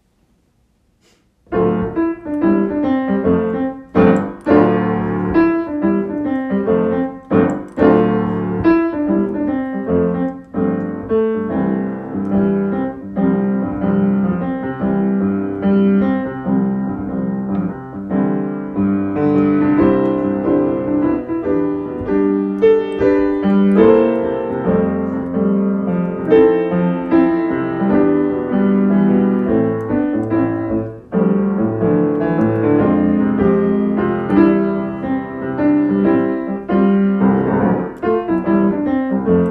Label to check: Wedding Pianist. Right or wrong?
Right